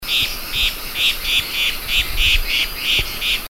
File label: Steeres Liocichla Alarm; Liocichla steerii; call (alarm); Bird Call Recordings